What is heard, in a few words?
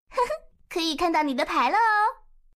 one Win